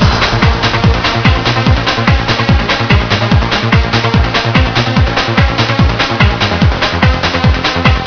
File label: music